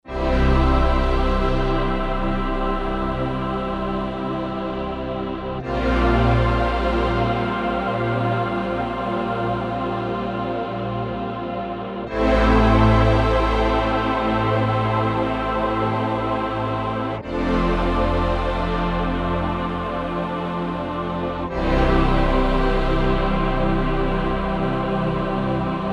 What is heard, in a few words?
Slow Choir; Digital Synthesizer